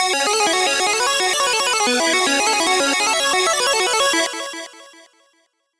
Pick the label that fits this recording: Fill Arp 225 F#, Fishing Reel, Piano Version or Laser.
Fill Arp 225 F#